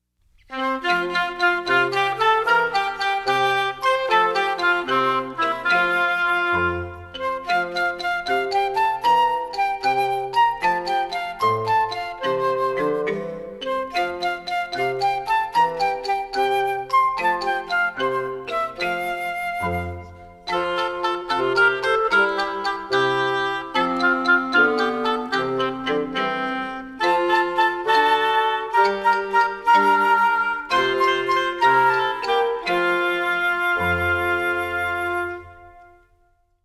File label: lift up your voice and sing music only; childrens songbook